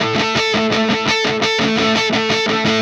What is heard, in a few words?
Static FX Guitar